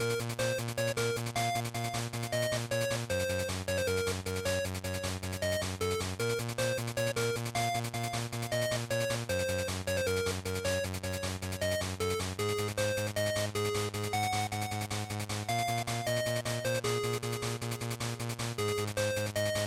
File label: Shooter, Stage, Loop